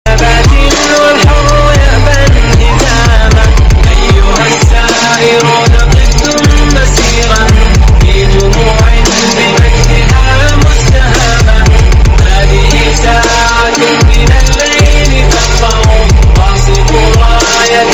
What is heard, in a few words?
arabic trap